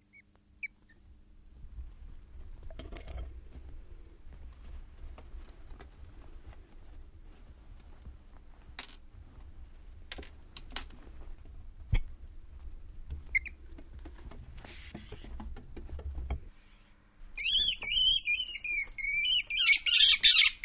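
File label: weasel in enclosure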